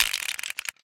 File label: spin Button Click; wild wild west